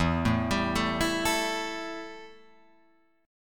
E Minor Double Flat 5th E Guitar Open F; Embb5 arpeggiated; 4,4,7,4,7,4

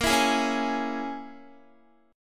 A sharp Minor 7th Flat 5th, down, Guitar; 4E,4A,5D,5G,5C,6F; strummed

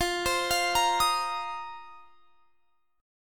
F 7th Suspended 4th F down; arpeggiated

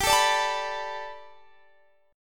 B Minor 7th Sharp 5th G, chord

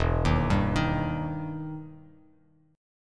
E Minor Major 7th E down Bass Standard; EmM7 arpeggiated

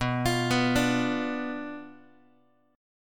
B Diminished B Guitar; Bdim arpeggiated; x,7,8,9,6,x; JGuitar

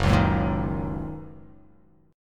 Fsharp 6th down, chord

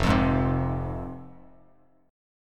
F Minor F down Bass Standard; Fm strummed

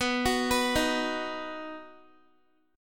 B Major, Guitar, 4E,4A,5D,5G,5C,6F; arpeggiated; x,14,16,16,15,x

